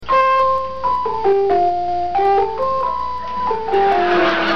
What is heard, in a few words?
doorbell